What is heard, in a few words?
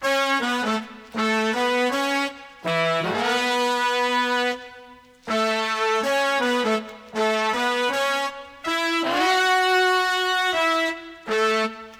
Classic Melody Loop 80 BPM A Maj Brass; Melodics; Loops; Loop Stems; Cymatics; Eternity